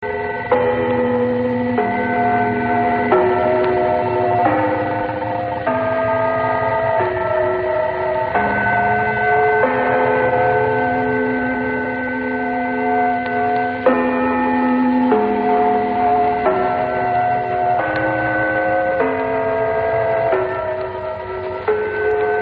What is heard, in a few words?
gong station; radio tv historisch